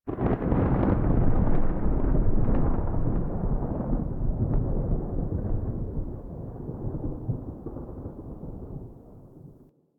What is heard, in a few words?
Landscape Thunder Crack Low, Ambience; One Offs; Warframe; Venus Open World